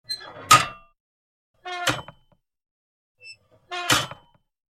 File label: metal, gnissel